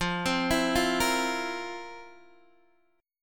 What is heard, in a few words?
F Major 7th Suspended 4th, Guitar, DADGAD